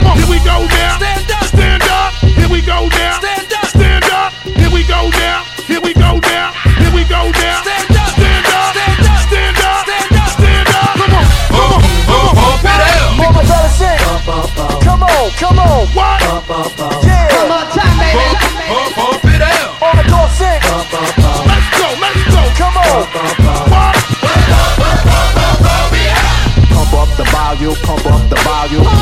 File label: Baby Cry